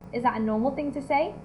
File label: beat